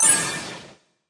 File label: flame speed